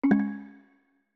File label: Call Mute; Medal